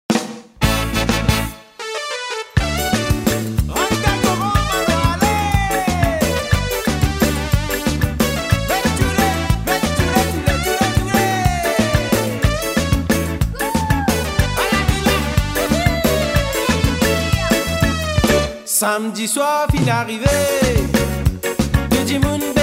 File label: SEGA